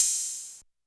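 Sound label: Hi-Hat Open Analog; YAMAHA RM1X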